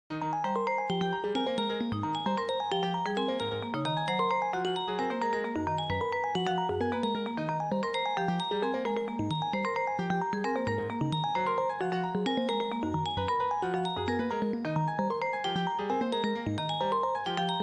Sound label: harmony example